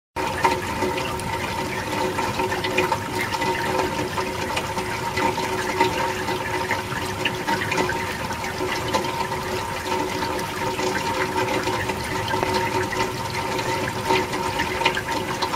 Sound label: Rain on gutter